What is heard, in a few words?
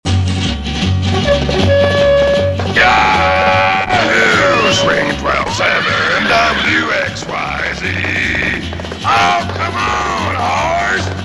Cowboy JINGLE; WXYZ-AM; Channel 1270